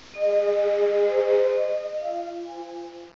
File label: Exit Windows; Lighthouse; Christian Desktops for Win98